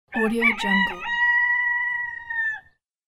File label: distant high crowing rooster